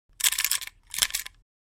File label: Mouse trap handling and setting up